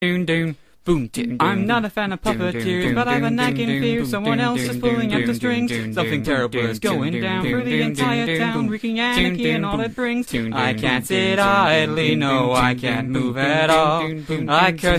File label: Singing; Edd